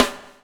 BUBL, Backbeat; Spectrasonics - Backbeat

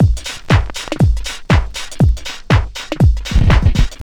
house loop

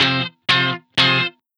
Guitar Stabs; Trip-Hop; Sonic Foundry (Sony Creative Software); Rondo Brothers Trip-Hop Loops & Samples

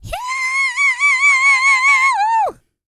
YELL, MALE HUMAN; Spectrasonics Vocal Planet

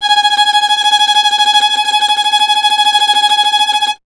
STR VLN, Vln Trem; Solo Instruments; Miroslav Vitous

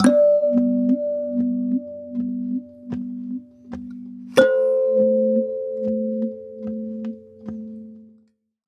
Kalimba, African; Sonic Foundry (Sony Creative Software); Continental Drift Aftershocks

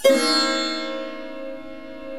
SAROD

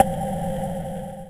Snare, Korg Z1

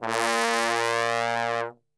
A#1 BASS TRB, Brass; Best Service; Brass Super Section

